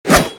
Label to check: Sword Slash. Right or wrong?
Right